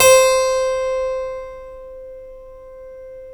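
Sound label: C4 clavinet left, Keyboards Acoustic; USB Soundscan vol.17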